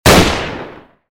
beretta fire; STALZONE

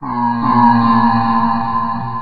moan, ambient; STALZONE

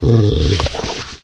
dog die; STALZONE

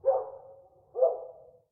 Small ambient dogs; STALZONE

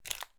Collect sound; STALZONE; minecraft; expedition1

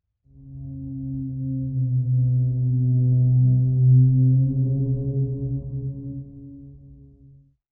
moan; STALZONE; minecraft

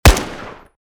aug a3 fire; STALZONE

